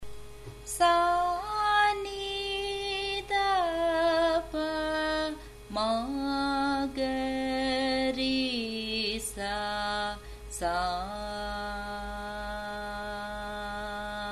Mandhra Sthayi G sharp, music; Carnatic Music

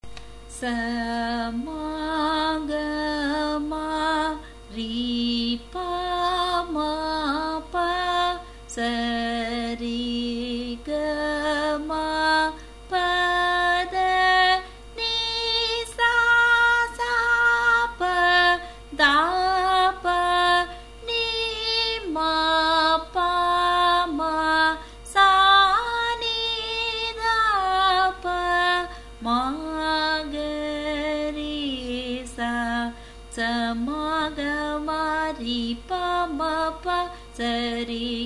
Dhatu Varise, A sharp

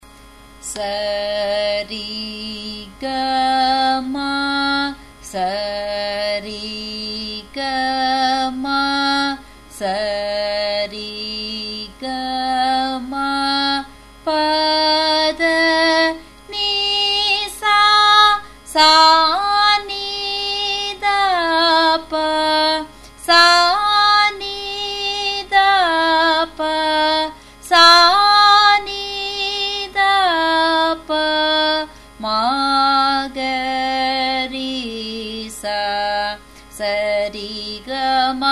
Sarali Varise G sharp, music; Carnatic Music

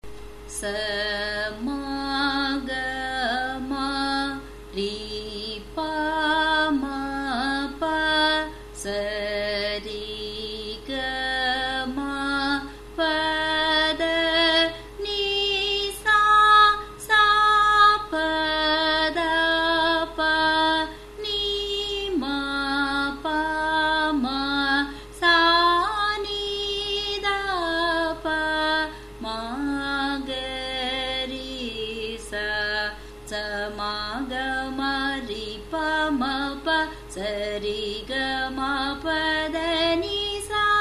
Dhatu Varise, G sharp

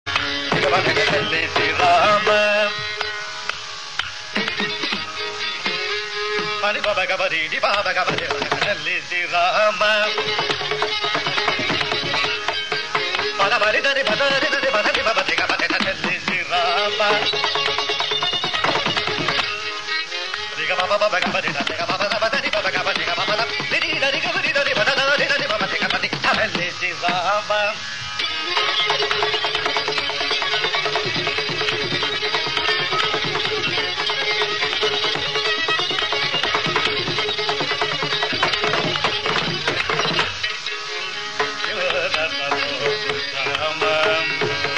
poornachandrika swarakalpana; manodharma; telisirama

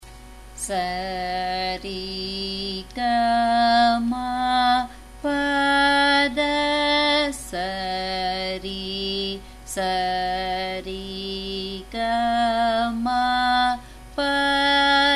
Sarali Varise, F sharp

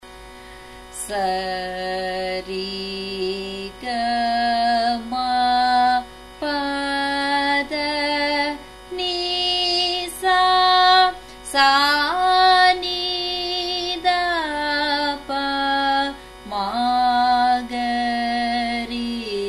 Sarali Varise, F sharp

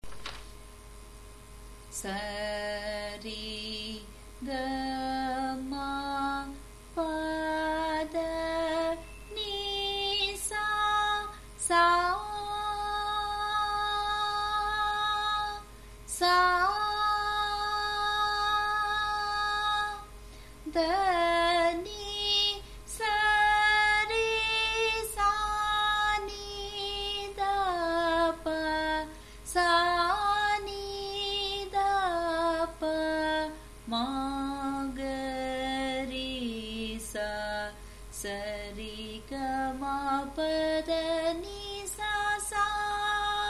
Thara Sthayi, G sharp

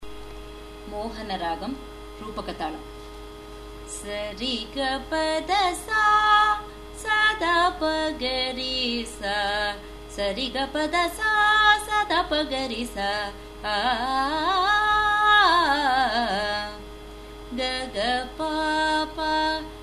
Vara Veena G sharp 5.5

